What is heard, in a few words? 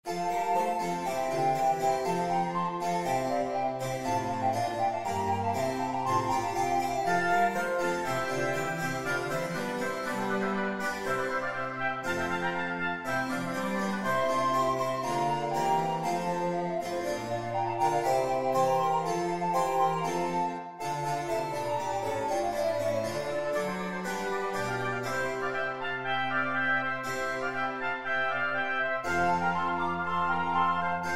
Sonata in F Major